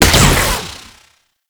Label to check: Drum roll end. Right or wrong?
Wrong